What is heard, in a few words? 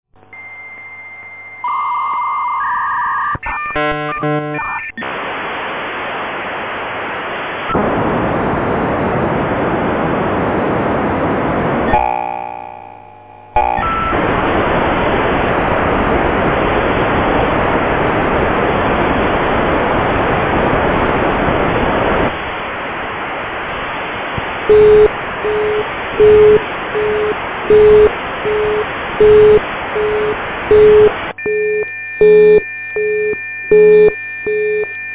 v90 incompatible, sound of modems